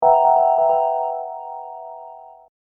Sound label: Hip Hop Dark Rhodes Licks 90 Bpm Swing E